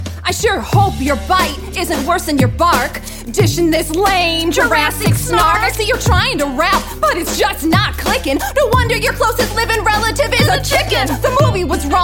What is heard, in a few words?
Rap Battle